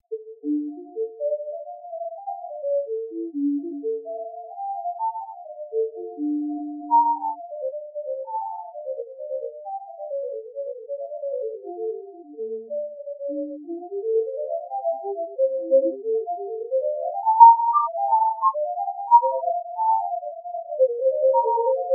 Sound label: violin; bach